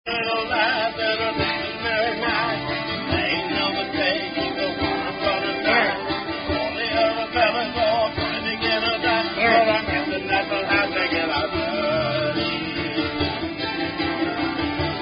song; Mary Mac; Reinfair SCA Song

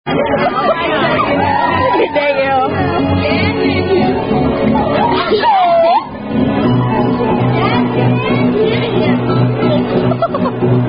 Delta Sigma Theta Chants; I Love My Dst!!!

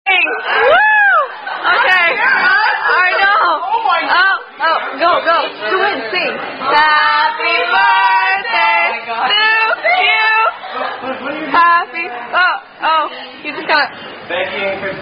Happy Happy Birthday; Chili's

